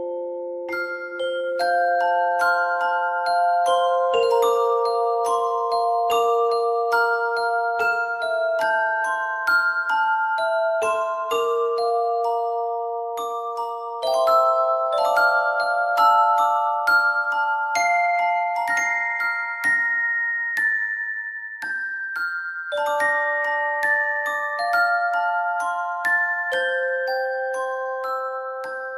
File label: Music Box